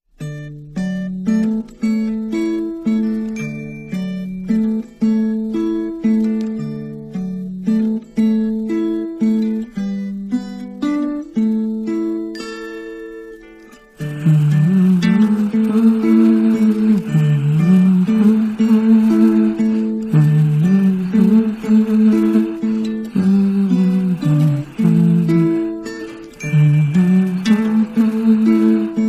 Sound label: Instrumental